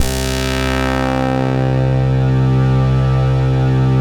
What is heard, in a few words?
KORG DELTA, LEADS